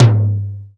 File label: Toms